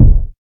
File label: warm sounding steel kick drum sample g sharp key